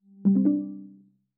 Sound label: Read This Sound; Apple iOS 18.1